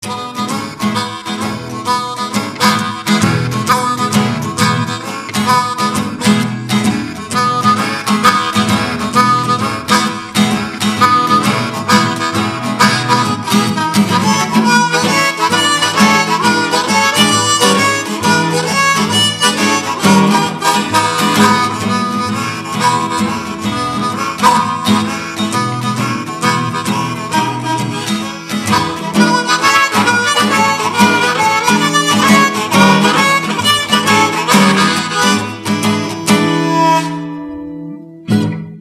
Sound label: Blues Riff